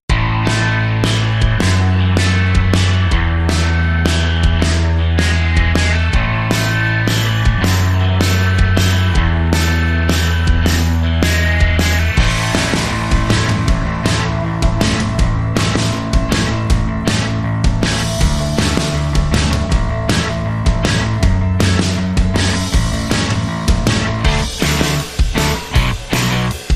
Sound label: Indie Spark, No Lead